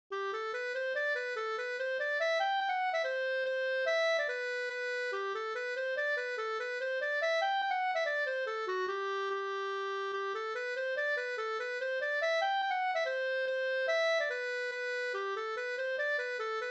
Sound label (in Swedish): mazurka; fr Ostmark e Daniel Nilsson Sunne Nr2 BgdIN p73-3